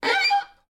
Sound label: Violin Screech Noise Short; soundscrate